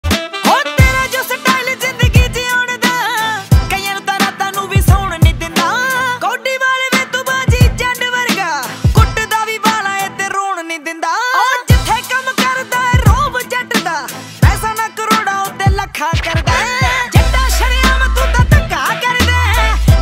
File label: female punjabi ringtone